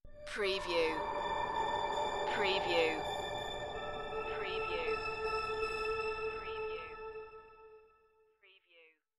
Trailer Production Elements - Effect - Distant, Wind, Lingering, Echo